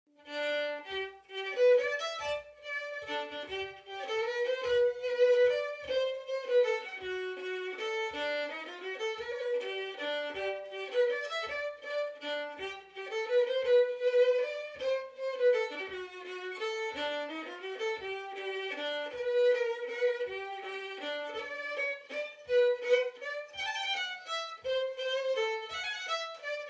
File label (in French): Mazurka; Martigues